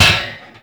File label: Metal Pipe Drop; Warframe; Ambience; NineteenNinetyNine